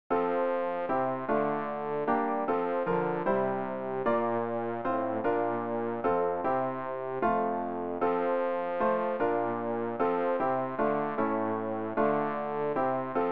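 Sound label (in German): bass, Übehilfen für das Erlernen von Liedern; vor den tueren deiner welt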